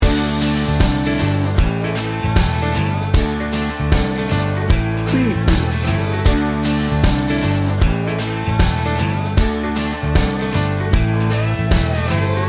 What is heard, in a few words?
americana heartland loop